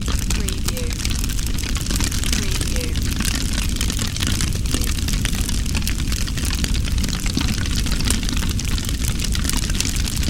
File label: Fire Flames Heavy